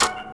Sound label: ladder; Thief